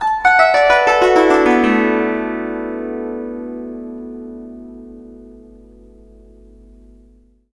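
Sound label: guzheng; Spectrasonics; Heart of Asia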